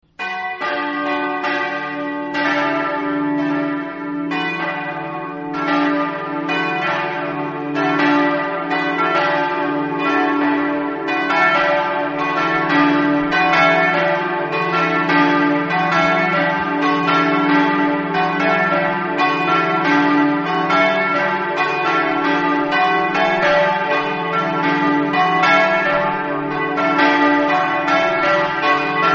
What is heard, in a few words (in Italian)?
campane; olloredo